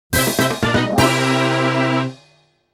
Start Grid Fanfare; Battle; Mario Kart Double Dash

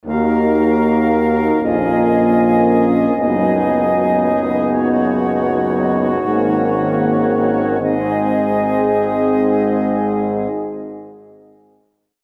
Med Calm; Sid Meier's Civilization Revolution 2; vita

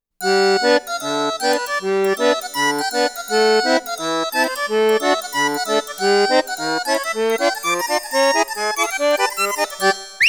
Continue Jingle; tokimeki memorial taisen tokkaedama poitter s point

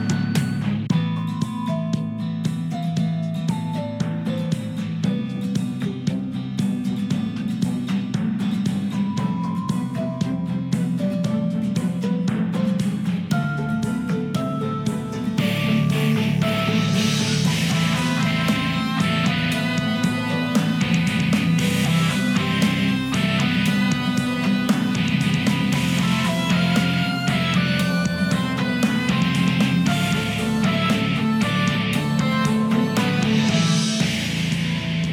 Lobby Electronic Guitar; Garena Free Fire Classic; Android

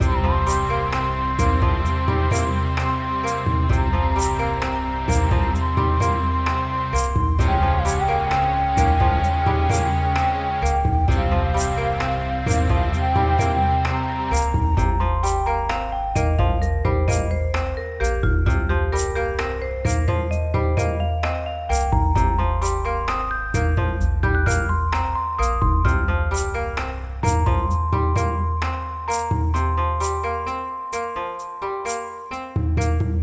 BGM Opening

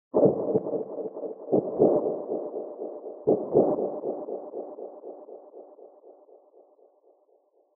Library Noise

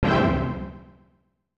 Tada!!; Hanjuku Hero; snes

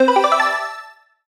Ui Shop Open; Nexomon: Extinction

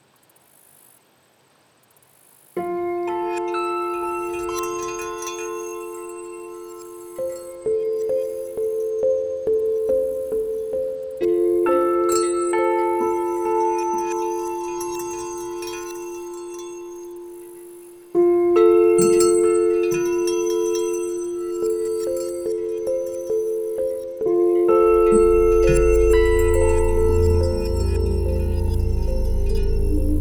Tranquil; Candleman 2018

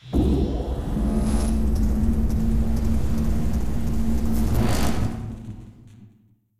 Reactor Start; hidden deep windows gamerip 2022

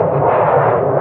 Freeze Beam; solaine

